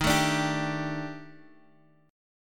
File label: Eb Major 7th Flat 5th, Bass Standard; strummed; chord